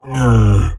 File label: Screams Shouts Monsters Monster Pain Death; Screams Shouts 2; soundbits